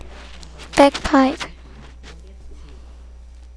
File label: bagpipe